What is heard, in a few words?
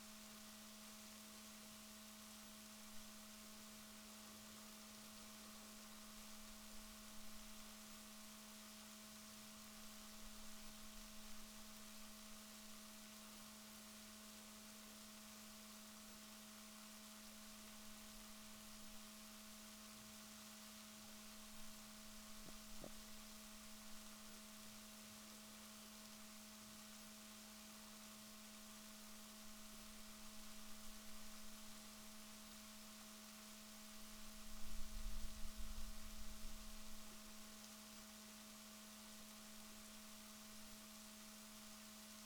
rorquals negatif, Balaenoptera Physalus Chile